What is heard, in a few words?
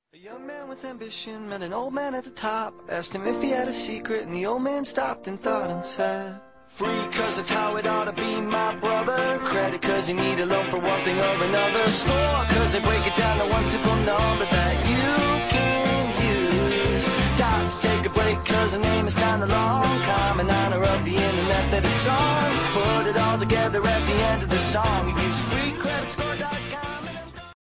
band commercial, ringtones; freecreditscore com